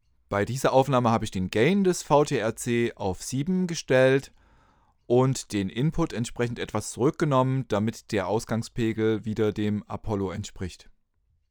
apollo m930ts

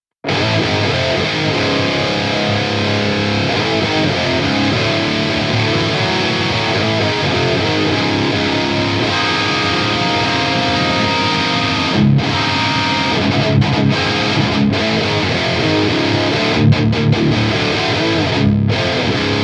mesa boogie dual rectifier; ampsims

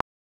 logic click; Logic Pro Klick

